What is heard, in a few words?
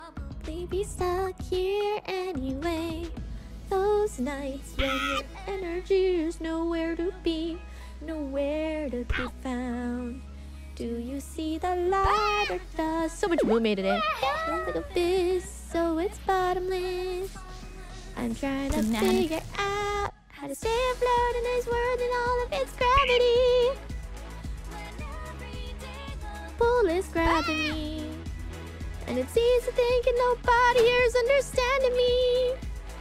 Singing; IRySinging